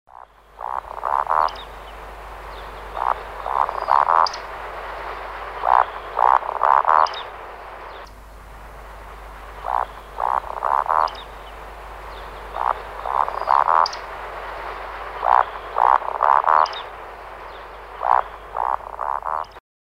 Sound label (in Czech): sluka